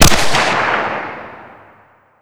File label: medium far distant, weapons; Modern Warfare